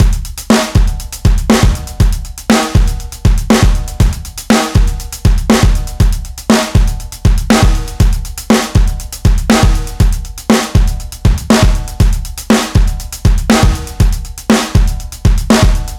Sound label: drum loop with drive; universal audio